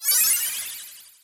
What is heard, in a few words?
chime; yo listen